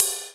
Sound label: Ride Cymbal